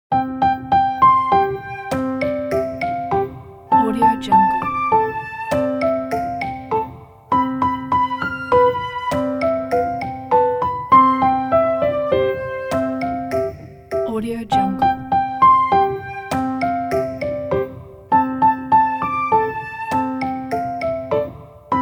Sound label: kids classical piano